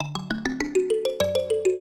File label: trio, mbira; Mozart